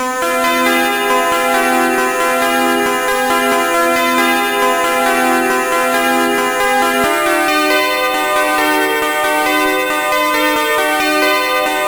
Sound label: guitar theme; Trackers; Modland